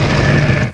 push stone, debris; XDM

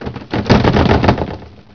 bust ceiling, debris; XDM

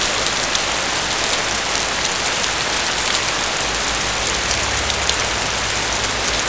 rain, ambience; XDM

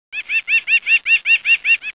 bird; XDM; ambience

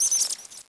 rat idle; XDM

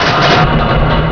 freight move; XDM

